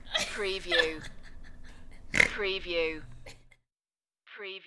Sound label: Laugh Snort